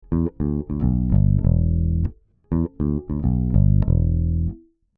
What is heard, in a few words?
API 512c DI Bass 9 o'clock Gain